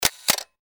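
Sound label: camera click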